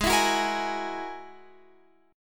Ab 7th Suspended 2nd Sharp 5th, Guitar, Open F; x,15,16,13,13,15; strummed

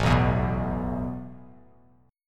A Suspended 2nd down; strummed; JGuitar

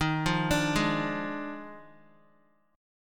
Eb Major 7th Suspended 2nd Suspended 4th, Guitar; arpeggiated; chord; JGuitar

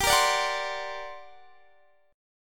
G Minor Major 11th, G; GmM11; strummed; x,22,20,19,19,20

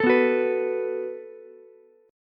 Gb Minor Double Flat 5th, Ukulele; Gbmbb5 strummed; 14,11,14,14

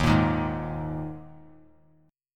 Eb Suspended 4th Eb 3C 3F 3A 3D; Ebsus4 strummed; JGuitar

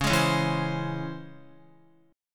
Db Minor Major 7th Db Guitar; DbmM7 strummed; DbmM7 chord; JGuitar

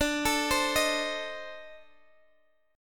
C Minor 6th D, chord

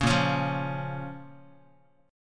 A sharp Minor A sharp down Guitar; 4,6,4,3,x,x; strummed; JGuitar

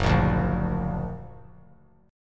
Gb Augmented; Gb+ strummed

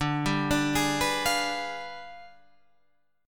G 7th Flat 9th

